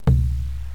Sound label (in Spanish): Bombo Leguero